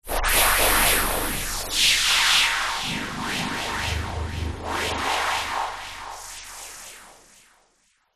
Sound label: sound design whoosh sweep noise filter mod; zapsplat